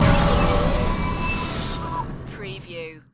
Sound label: Stinger; Sound Effects Bible